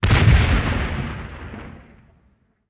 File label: cherrybomb; soundspvz